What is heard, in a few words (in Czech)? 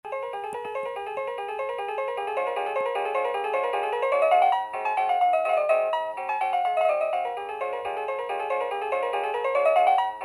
ukazka zvuku alarmu